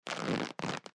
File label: window